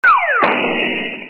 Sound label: fall in sewer